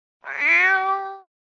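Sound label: philosophical meow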